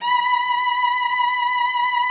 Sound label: B5, cello vib; Xsample VOL-7